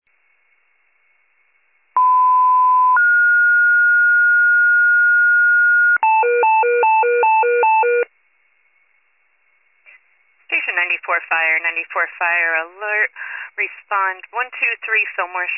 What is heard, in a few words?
FIRE pager alert